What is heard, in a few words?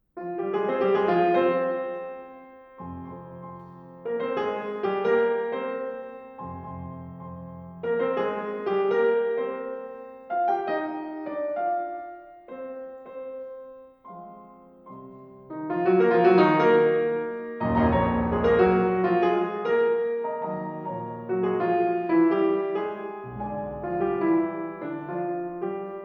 Piano Sonata in F minor